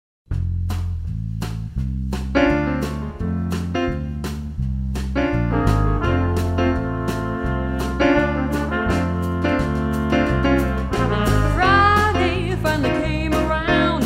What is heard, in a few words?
vocal